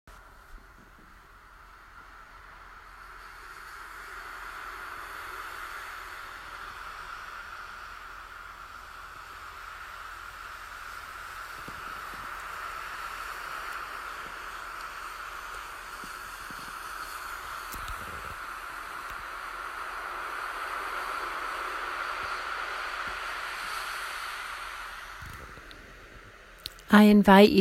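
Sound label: ocean breathing meditation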